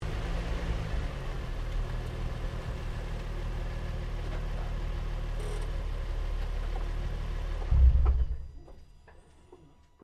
CAR NISSAN 350Z EXHAUST SHUTDOWN, manual sportscar; Cars (Sound Effects:Vehicles)